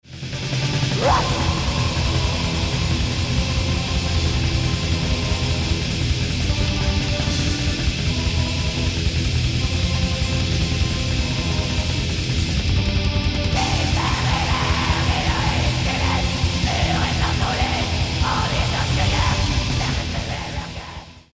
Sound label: riff